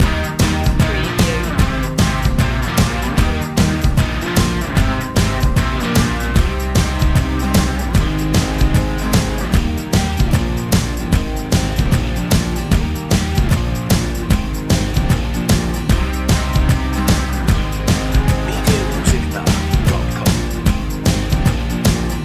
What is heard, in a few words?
get down loop